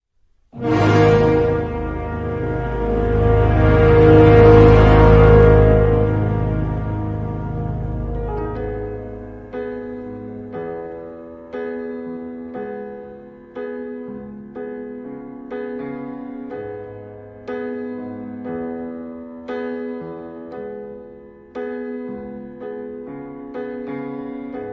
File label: slowed and reverb